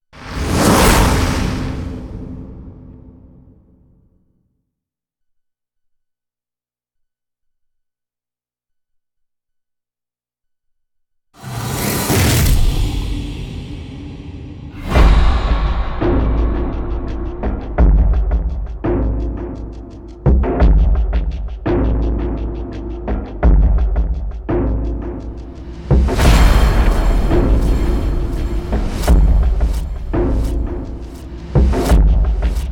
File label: percussion only